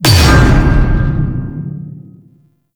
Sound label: Sentient Vessel Hit Notification; Warframe; Tau; Hit Notifications